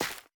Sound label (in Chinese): 垂根破坏声